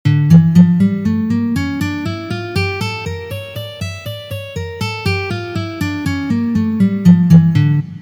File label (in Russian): Gamma Re minor garmonicheskij vid 5 pozitsiya